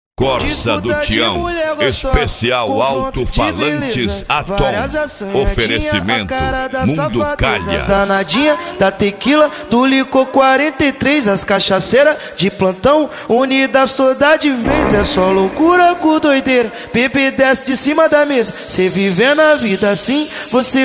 esp insane sound; azeitonas car; barretos

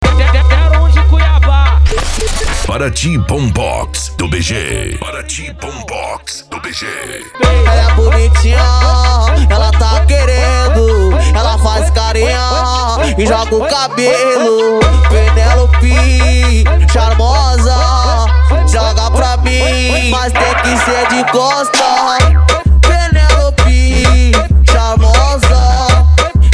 bombox funk

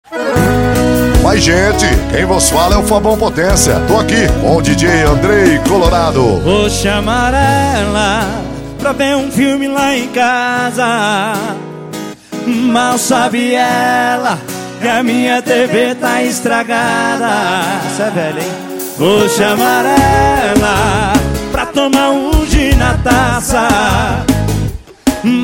sertanejo com locutores de rodeio

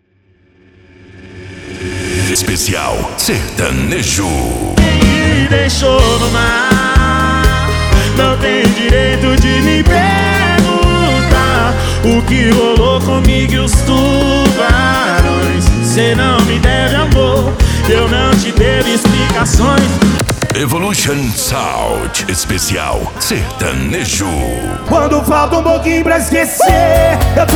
esp sertanejo

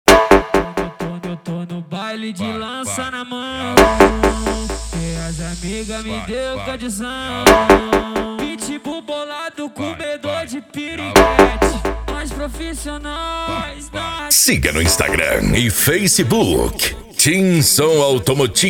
funk tum dum

